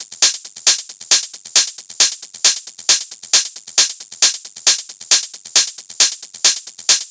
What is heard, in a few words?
Hat Loop 135, Techno